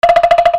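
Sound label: percussion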